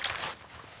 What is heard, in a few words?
cloth rub; mg43; tacz; RFP v1.0.0 beta2